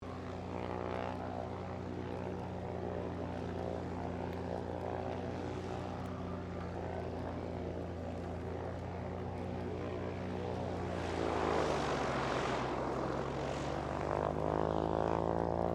AIRCRAFT CT4A PREFLIGHT DISTANT, military trainer aircraft; Airplanes; Vehicles